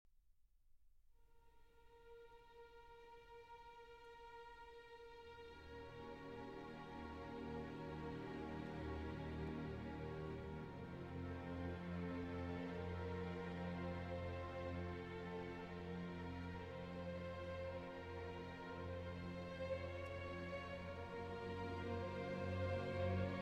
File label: Adagio for Strings; Barber